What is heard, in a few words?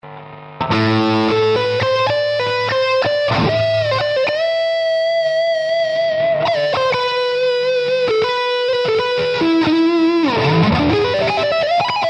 plexi S dist, PODxt Live